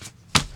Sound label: discard